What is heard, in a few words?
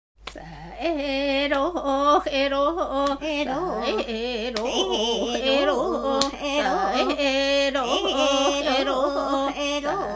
ainus, polyphony